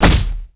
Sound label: punch